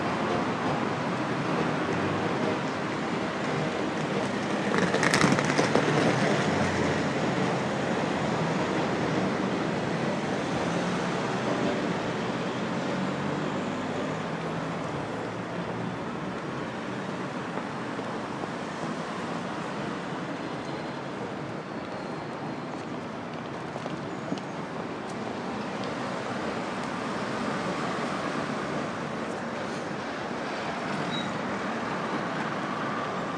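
60 seconds of neville street leeds morning ambience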